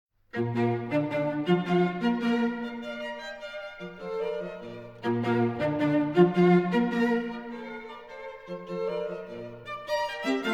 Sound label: String Quartet; Haydn; String Quartet No.1 in B flat, H.III No.1 (Op.1 No.1)